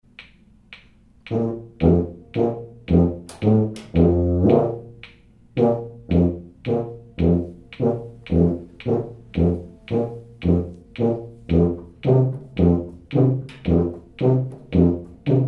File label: Tuba; Bayside